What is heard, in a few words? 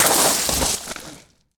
gravel fall; firefight